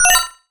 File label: accidentally chord